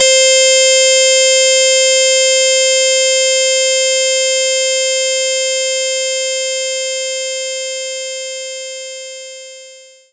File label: harpsichord C5; knights try